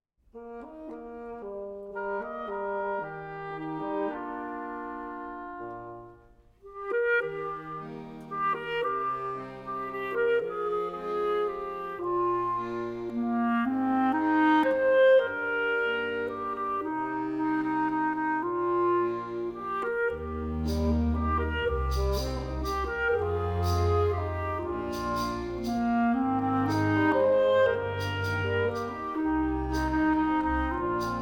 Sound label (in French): clarinette